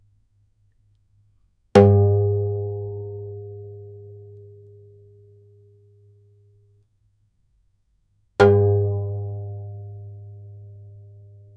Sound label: single one shot banjo